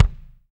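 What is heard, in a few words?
BD, bass drum; Best Service Gigapack 2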